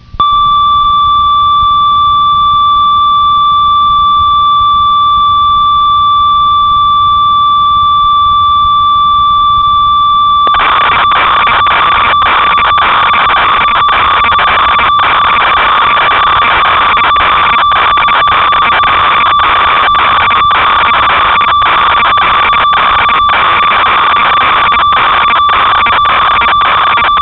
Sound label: ob6, synth